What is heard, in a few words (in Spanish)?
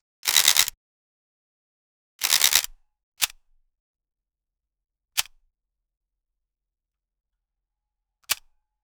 disparo profesional del o, photography